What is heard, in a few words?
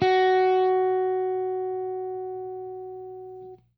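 jazz line, guitar; Quantum Leap - Guitar & Bass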